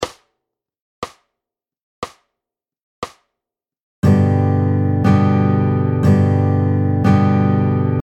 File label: g chord